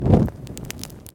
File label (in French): Son Feu